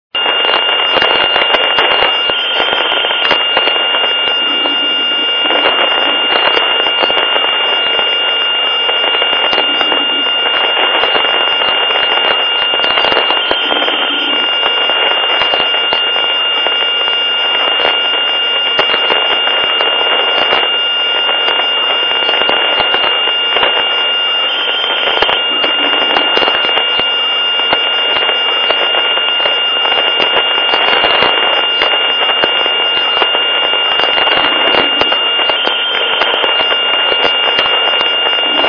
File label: QRN